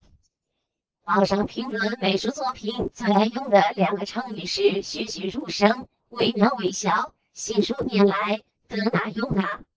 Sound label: Sinewave